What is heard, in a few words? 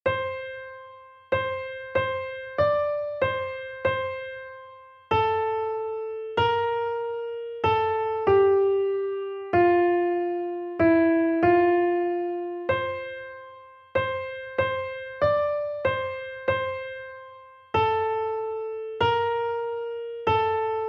melody; lo how a rose e'er blooming